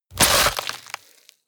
rip bone and flesh short

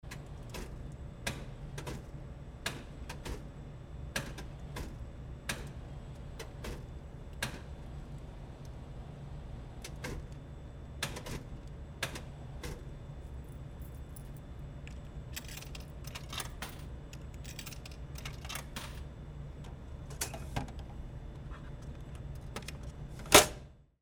food vending machine

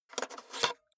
cassette out of cassette player soft

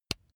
razor attachment mount

